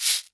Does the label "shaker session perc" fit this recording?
Yes